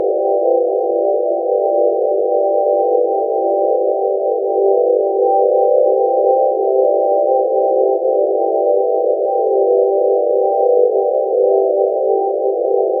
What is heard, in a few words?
color Vision Mid Ambience